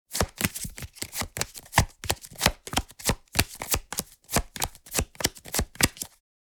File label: Flipping through cards board game